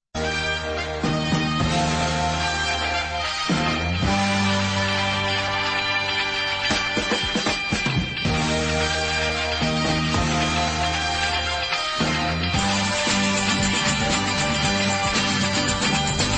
musica de abertura encerramento; esporte espetacular

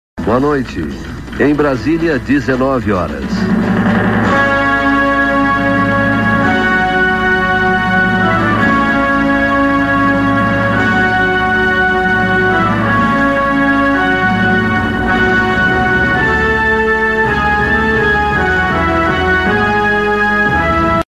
vinheta antiga; voz do brasil; o guarani; brasilia; 19 horas

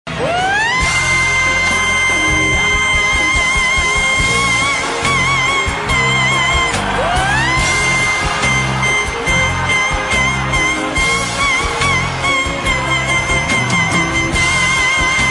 gritando

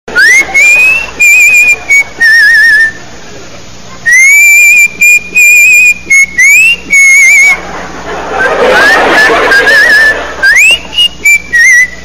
assobio

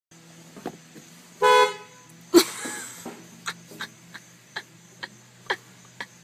uma buzinadinha

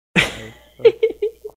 risadinha

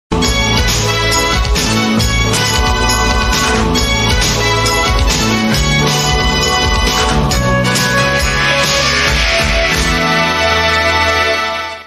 vinheta; vale a pena

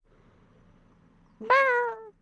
notificacao; gabi

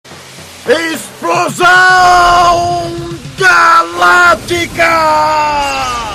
explosão galática

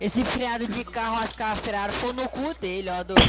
freiada de carro

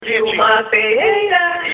vinheta; gilmar ferreira

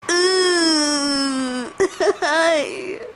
gemido

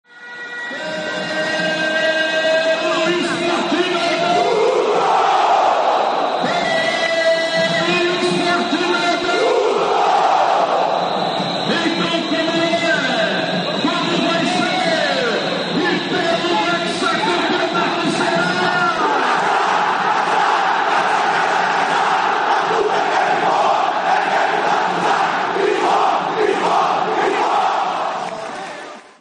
maior grito de guerra do planeta; sport clube do recife

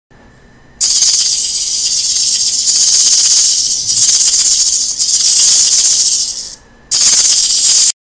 caxirola

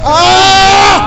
gritando

